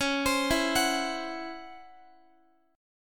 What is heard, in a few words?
C sharp Minor Major 11th C sharp Guitar; C#mM11 arpeggiated; JGuitar